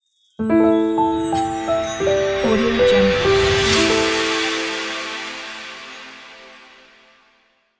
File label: dreamy logo